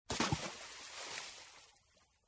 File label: splash